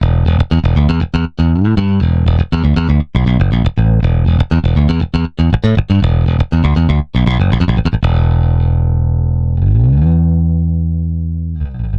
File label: bass; REDDI